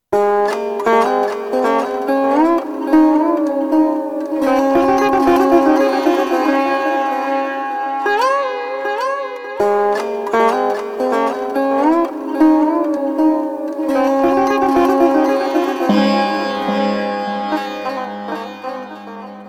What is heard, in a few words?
sitar; ayurveda; ballada